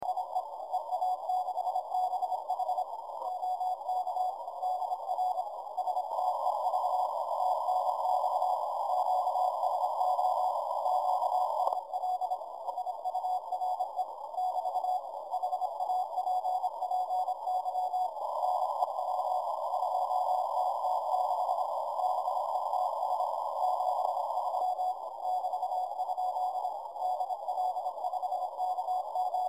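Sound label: 30 metre directivity noise reduction CW; EA6BH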